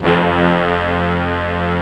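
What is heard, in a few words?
mix brass section F1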